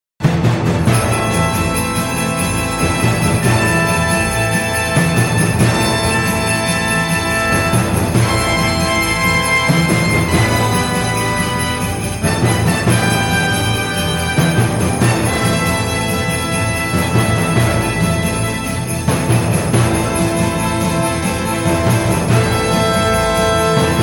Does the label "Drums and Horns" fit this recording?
Yes